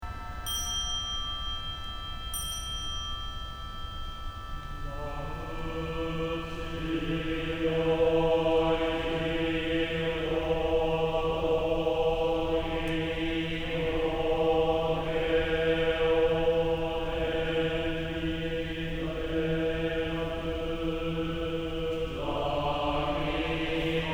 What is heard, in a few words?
shomyo